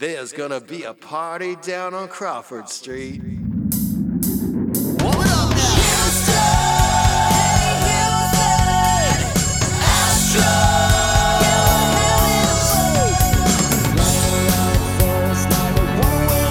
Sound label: male keyboards bass; music smorgasbord